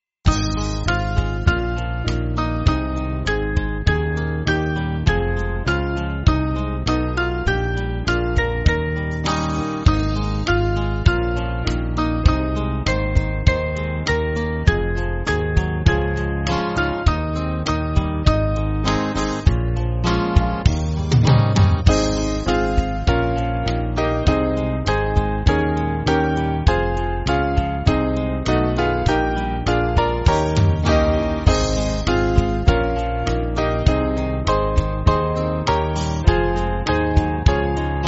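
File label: Band, Music Backing Files for Churches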